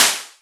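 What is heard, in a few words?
Boss DR-202 percussion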